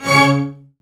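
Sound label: String Section; CMI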